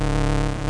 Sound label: tank loop; miniBAE source code audio